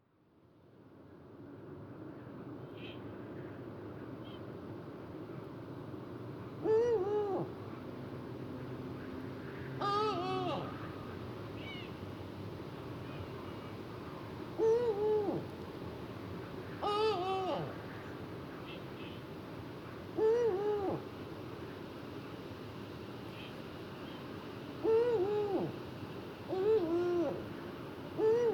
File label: Eagle Owl